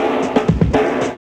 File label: Fills, Roland; Sound & Vision - Gigapack I; Perc